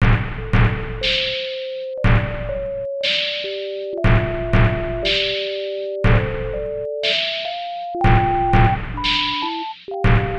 Sinewave

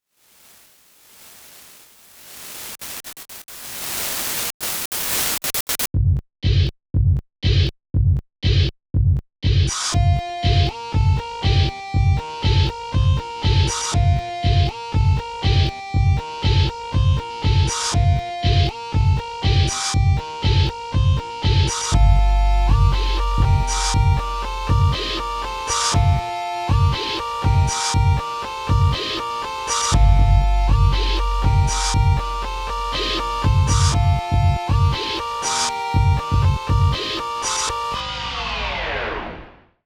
Sine Wave

